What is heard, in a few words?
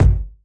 kiks; drums single hits